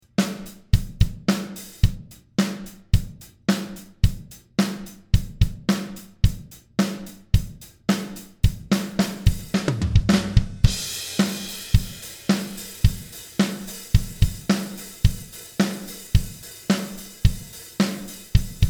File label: Mixed Drums